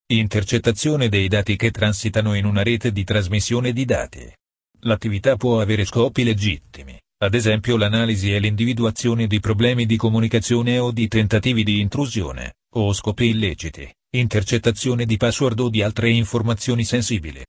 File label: sniffing